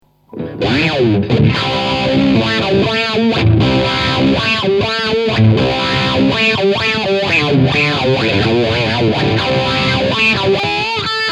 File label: Morley wah dist, guitar; Morley Kiko